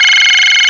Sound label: Retro Ring; Alarms Blip Beeps; GameSFX